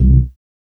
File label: DM BAS, Dance Mega Synth; Best Service